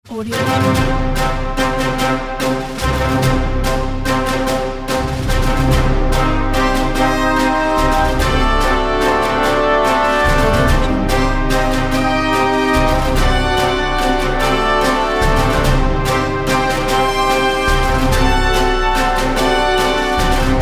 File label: star battle victory; musicforyourmedia